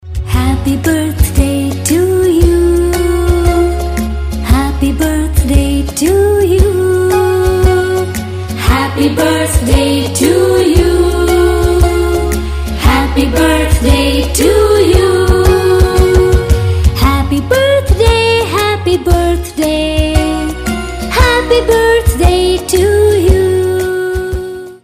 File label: happy birthday ringtone